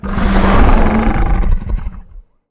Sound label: animal emotion; silverback